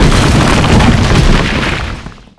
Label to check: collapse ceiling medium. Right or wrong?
Right